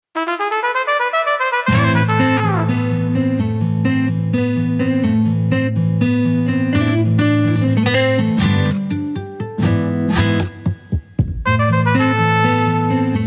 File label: trumpet guitar; bk-9; supernatural